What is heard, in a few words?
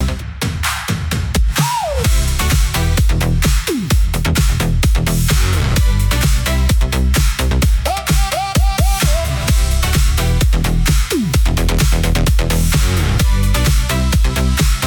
loop; opening